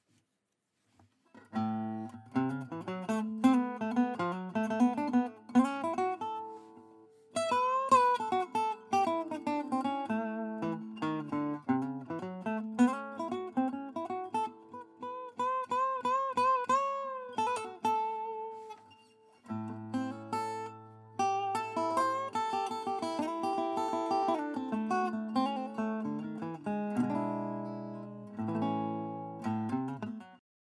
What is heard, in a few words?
noodling, requintonator